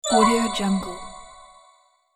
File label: fairy warning